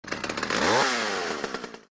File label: chainsaw Sound